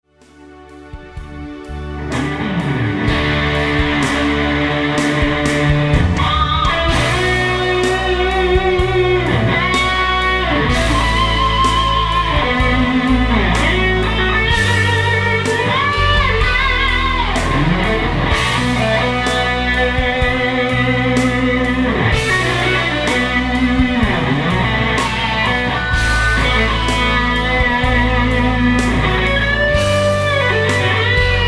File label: solo, guitars